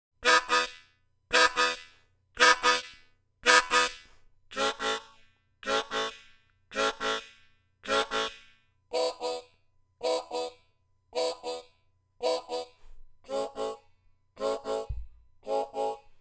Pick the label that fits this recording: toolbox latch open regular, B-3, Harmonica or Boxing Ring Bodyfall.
Harmonica